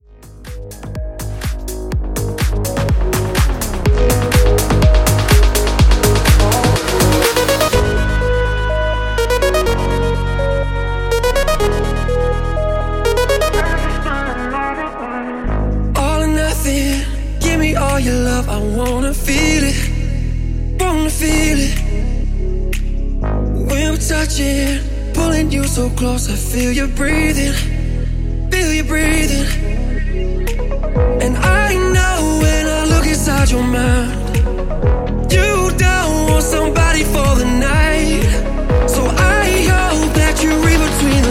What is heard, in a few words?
Future House Intro